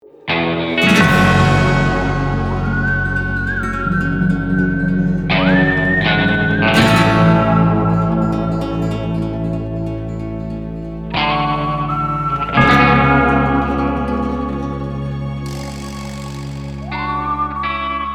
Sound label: Score Western; Der Lehrer